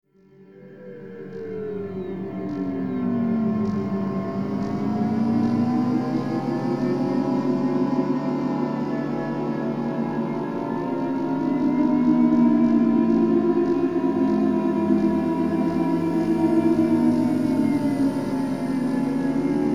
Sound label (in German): Soundkulisse